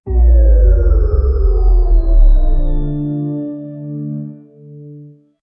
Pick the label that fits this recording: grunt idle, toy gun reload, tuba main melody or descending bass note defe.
descending bass note defe